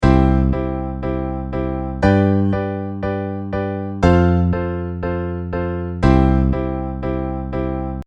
Rhythm Example Piano Downbeat

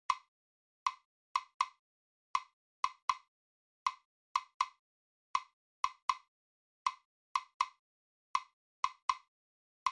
SWING EXAMPLES 80

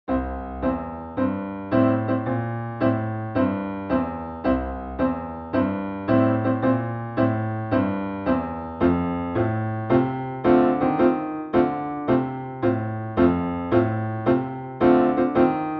I IV V IV Piano C

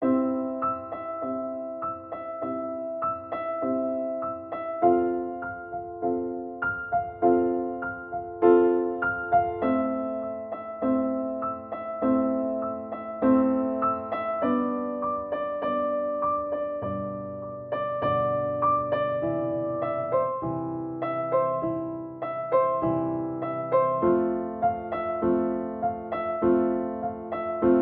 I IV vi V Piano C

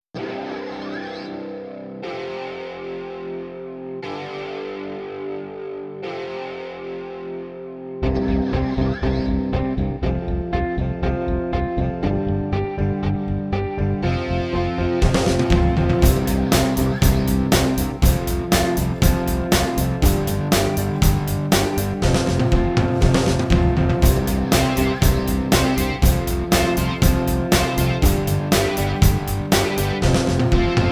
I IV V IV Rock C

